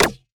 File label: Dubstep Perc, Percussion; Drums; One Shots; Cymatics; EDM Starter Pack